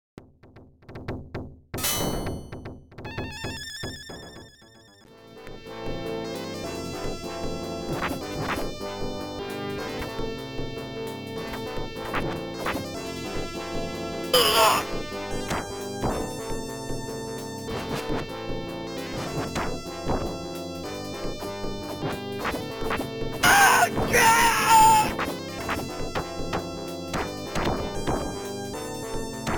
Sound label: sword